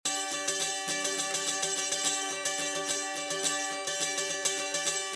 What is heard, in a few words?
guitar